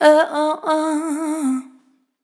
vocal riff ewohohuh Cm

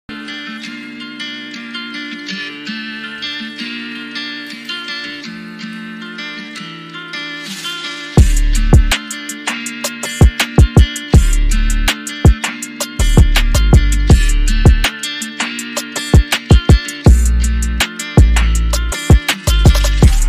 Drum Kit; Psycho

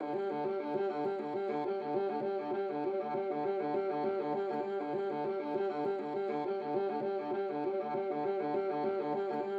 100 G Stem Viola; Opus8

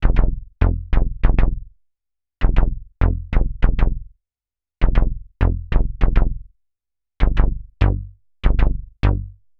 Noise Slap Juno Synth Bass, 100, F

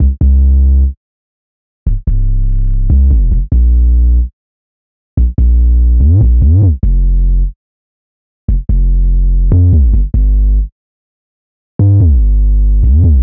145 synth bass 808 C min

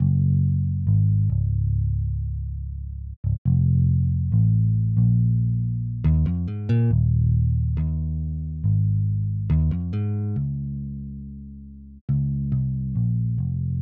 C 139bpm bass; Sunday Morning Returns

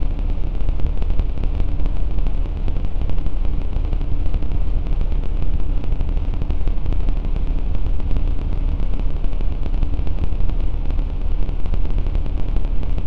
FLOW SOUNDSCAPE D

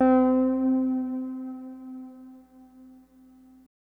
Synth Keys; Dulce

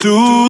vocal shot g-

